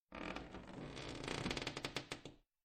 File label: Door Open